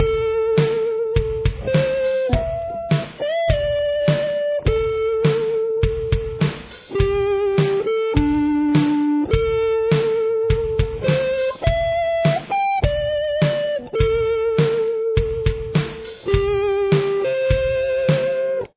lead, guitar

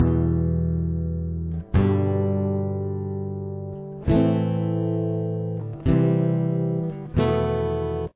verse chords, the strumming part; Here is what the strumming part sounds like in the second bar

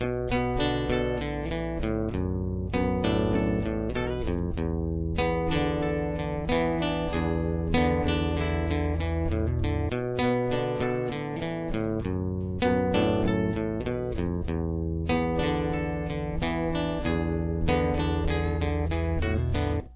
break, guitar

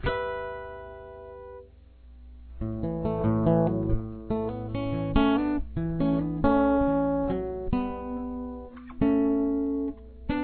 verse lead, guitar

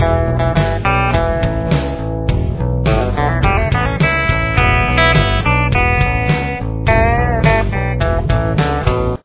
solo 1 main

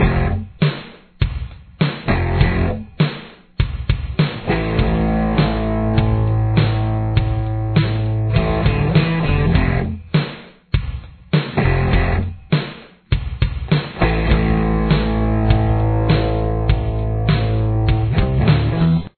intro guitar

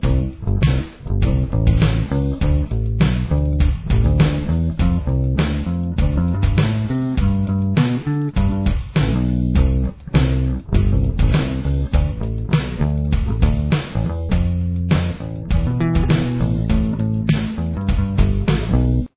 chorus bass

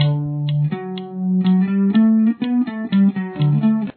detail, guitar

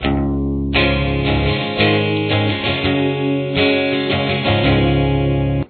chorus, guitar

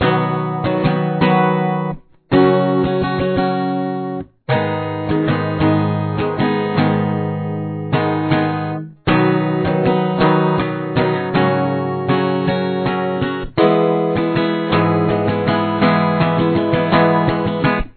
prechorus, guitar; You must use a capo on the fourth fret for this song.

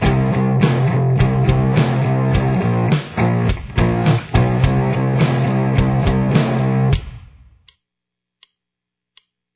intro guitar

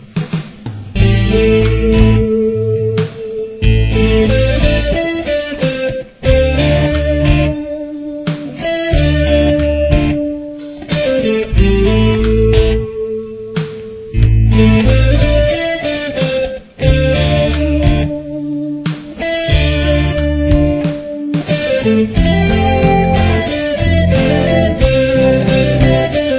using scales octaves, guitar

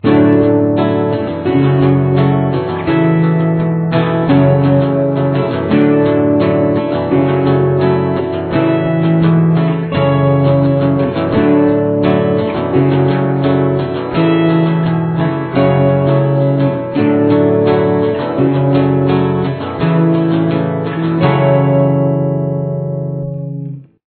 chorus, guitar

